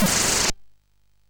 Shuffle, MOS6581R3; hvsc

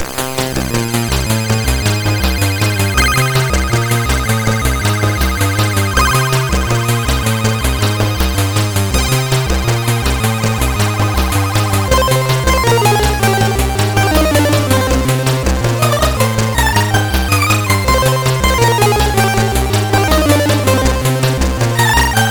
Elevator Action, CSG8580R5

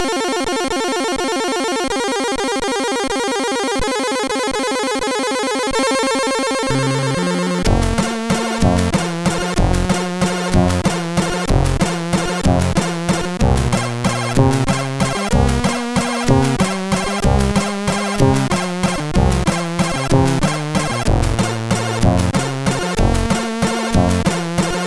Waltz, CSG8580R5; hvsc; Froyd

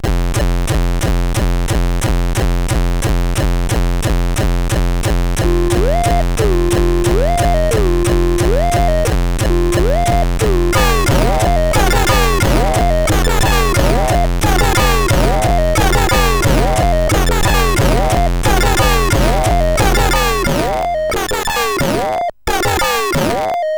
Hardcore, CSG8580R5

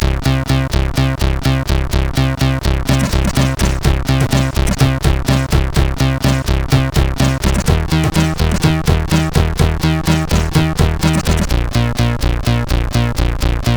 Splash Crash, CSG8580R5; sid

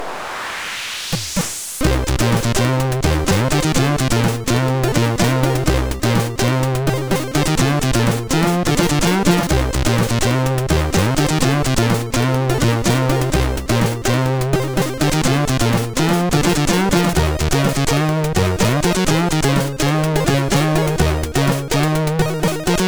Bassmania, MOS6581R3

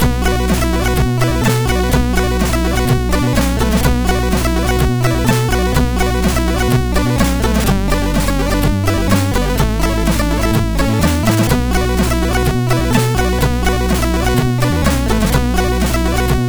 Intro Tune; sid MOS6581R4; Moon

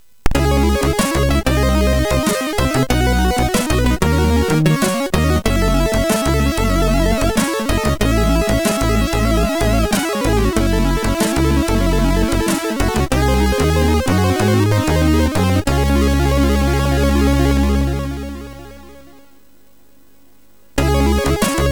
Jingles, MOS6581; MOS6581R4

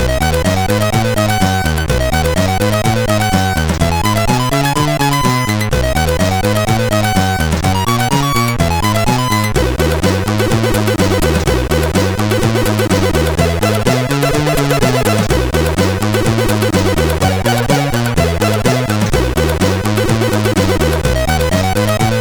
Clock Rock, CSG8580R5

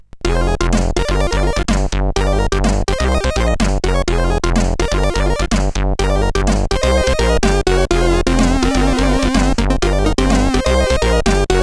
Intro, sid, CSG8580R5

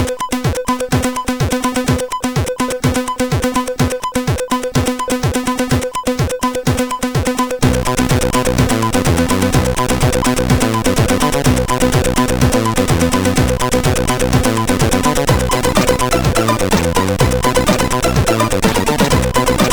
Very Old Crap, MOS6581R4

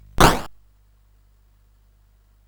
Dark Castle, CSG8580R5

